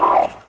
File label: ping, turret floor; hl2